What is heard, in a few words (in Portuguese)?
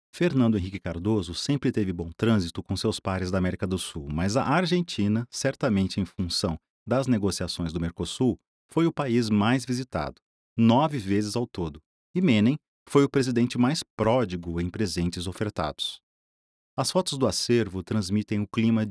tango na alvorada